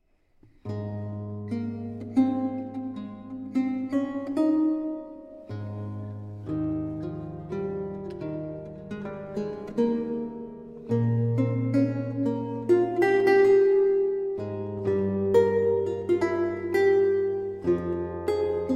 Prelude, Lute suites; Le reveur; Charles Mouton

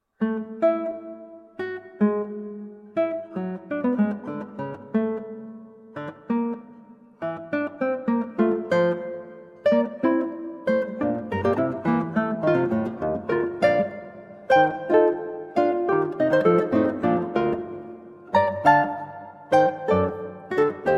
Fugue, Guitar; Fugue no 12 from 36 Fugues for Piano; Anton Reicha

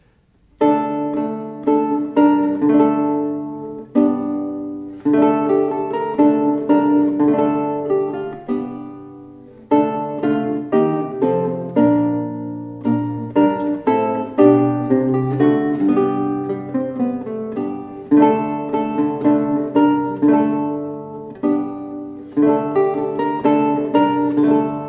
Polish dance, Lute; Matthaeus Waissel

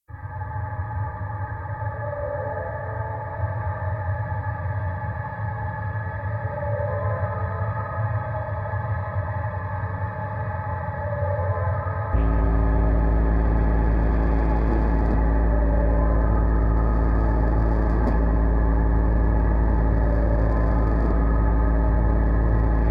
Dub; Noise HiFi; Red Distortion

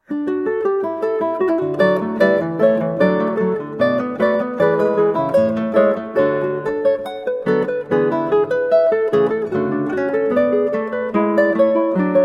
Prelude, Guitar and Ukulele; JS Bach; Johann Sebastian Bach

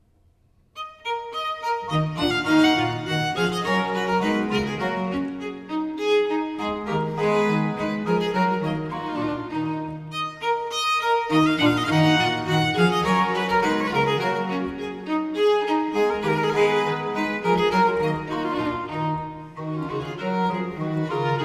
Sonata No. 3 in e minor Gavotte; Haendel; Sonate a trio op. 5

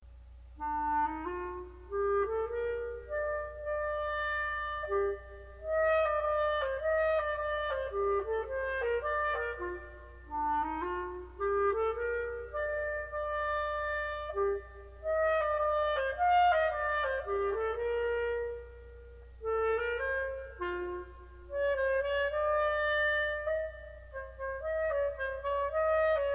Allegro Moderato for Solo Clarinet; Romeo et Juliette Op24 No22; V Bellini arr Klose

